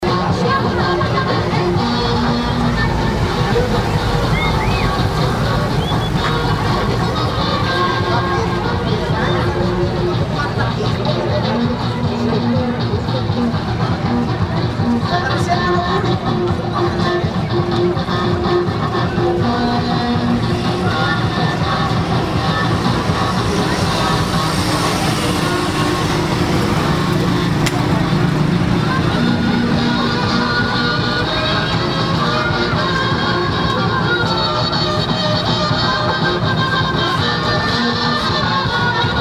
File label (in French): instruments